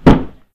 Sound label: Kick